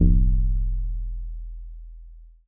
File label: Steel Drums DX100 E0